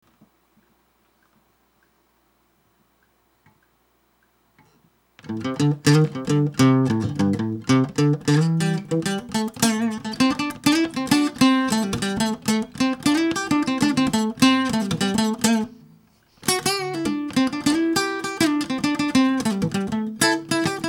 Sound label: Acoustic 12 bar lead in Am